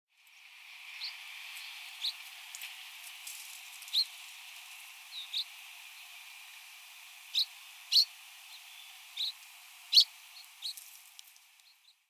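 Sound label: Pallas's reed bunting, hyogo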